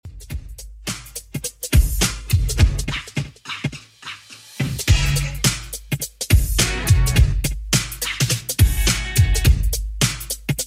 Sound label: 80s Redrum 108bpm; remixplanet